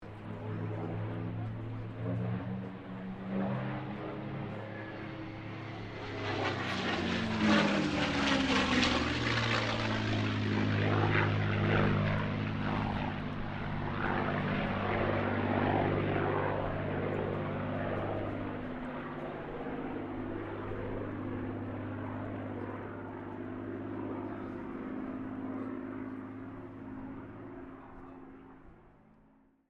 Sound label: AIRCRAFT CAC CA-18 MUSTANG MK23 PASS WINDY; CA-18 /P-51 Mustang; Airplanes; Vehicles